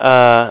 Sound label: "uh", different